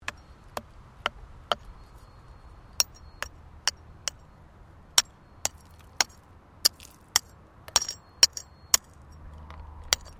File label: Digging Small Handheld Tool Sequence; Various digging tools and processes; Construction